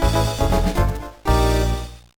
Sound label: Jingle; Isseki Hacchou Kore 1 Pon de 8 Shurui; GBA